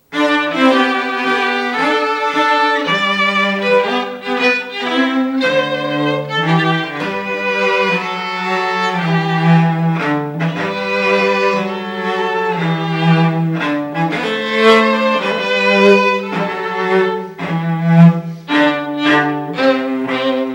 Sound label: Contemporary String Ensemble